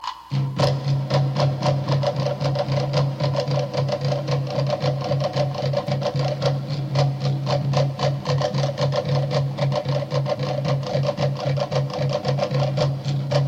Drums of Tobago

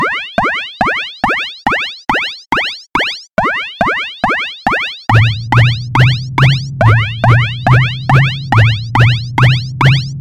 Beat Check Sonar

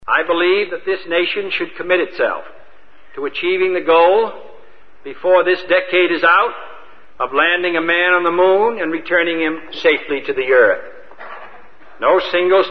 Is Calling Inspirational

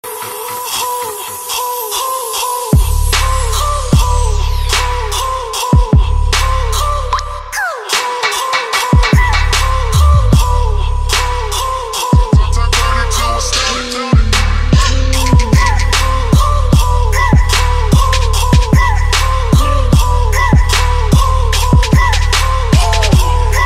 bass Trap Remix

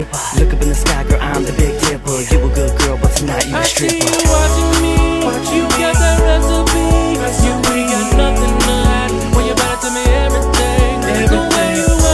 cute jp ringtone; ringtones

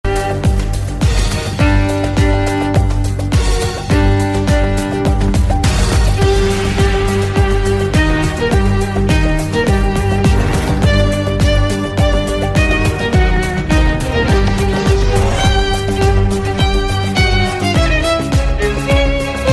violin

